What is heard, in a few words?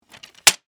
Wood Chair Unfold, Open; Foley; Materials; BlastWave